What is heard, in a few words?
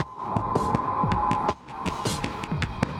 Drum loops; Just Add Drums